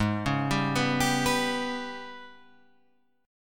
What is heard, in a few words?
G sharp Minor Major 7th Double Flat 5th